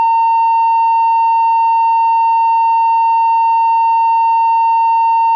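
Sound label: Oscillator Sine 880hz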